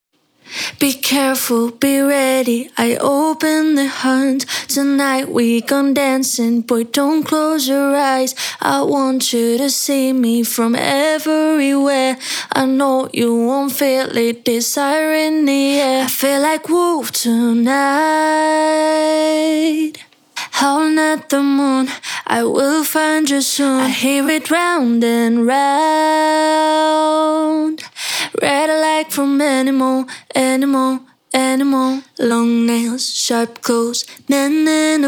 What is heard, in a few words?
Vocal Lead DRY A-m